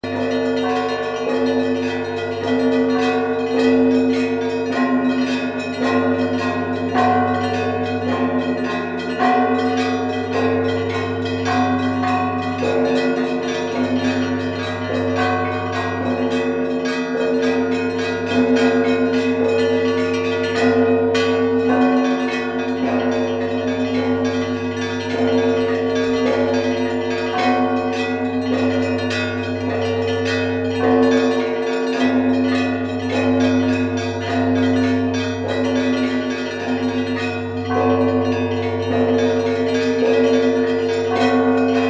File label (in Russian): pashalnyj zvon